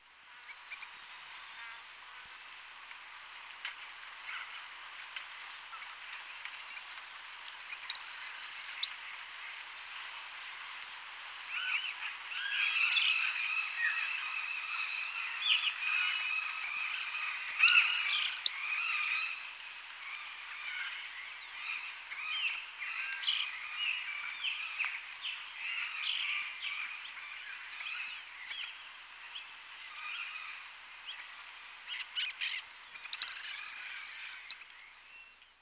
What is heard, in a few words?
machetornis rixosa bentevi cavaleiro; fauna